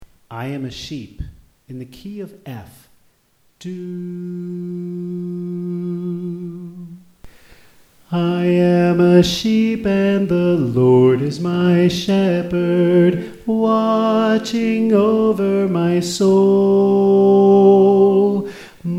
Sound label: Soprano